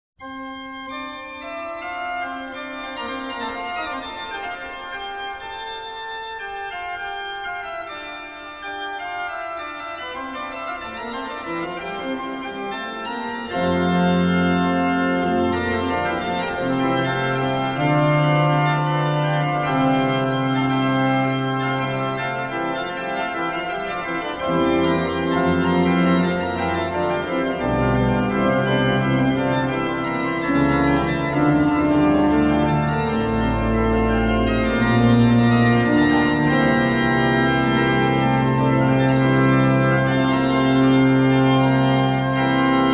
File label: Praeludium in E Major; Dietrerich Buxtehude